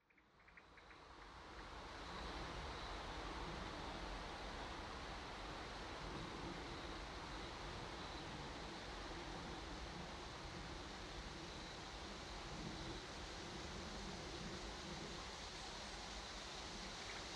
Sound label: Forest